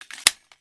clip in, weapons; brainbread

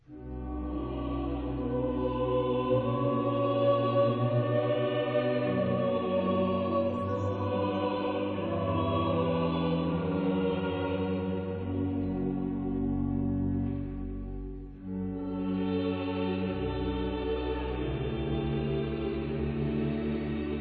choral

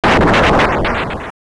thunder

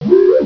woooehh; svencoop; coldburn

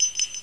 awp post fire, weapons; svencoop

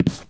player tile

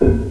door stop; gearbox

